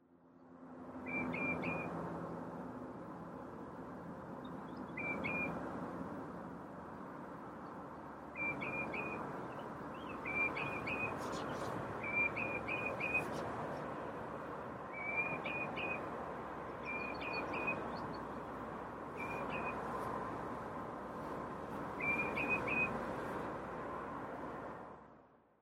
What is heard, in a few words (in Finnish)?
kutsu, linnut; punajalkaviklo; kutsu3